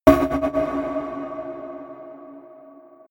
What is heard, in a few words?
energized fuse; whooshes-rises; hits-impacts